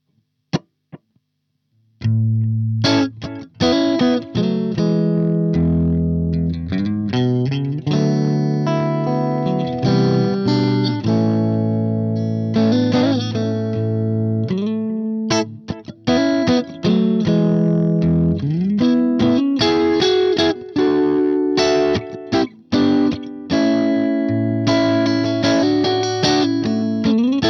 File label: Echotone Pop; Carl Martin